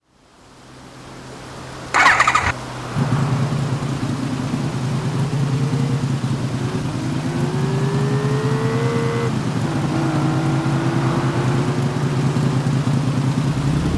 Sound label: messerschmitt tg 500; TDU